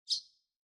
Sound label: Bird Chirp; SoundsCrate